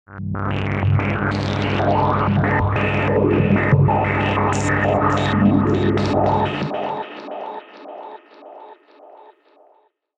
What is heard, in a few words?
Blood Suckers, Monster Sound Effects; horror sound effect